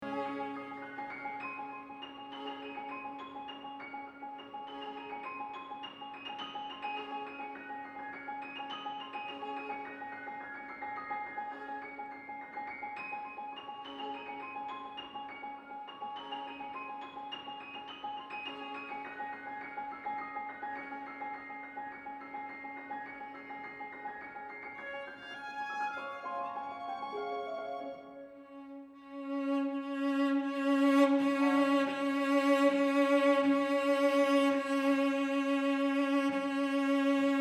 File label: Piano Trio; tchaikovsky piano trio in a minor op 50